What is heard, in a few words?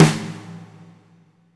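fat snare right; Spectrasonics; Burning Grooves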